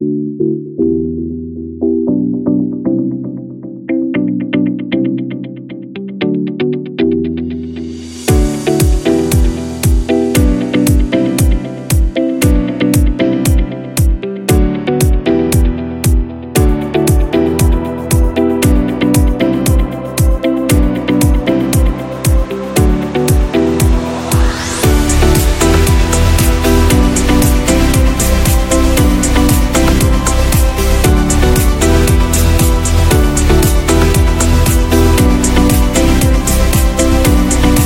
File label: A Corporate